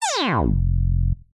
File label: synth space weird synthy sci fi robot accent fail